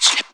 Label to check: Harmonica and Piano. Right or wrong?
Wrong